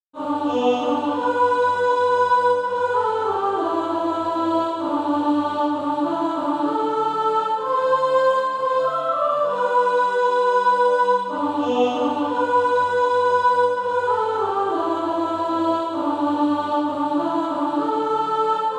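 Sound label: sop, Practise Music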